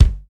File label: strong bottom end kick single hit g key, Royality free bass drum single hit